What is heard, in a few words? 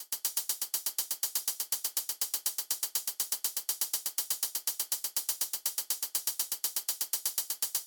122 BPM Hats; mmb drum arsenal vol 1; Hats Essentials Hats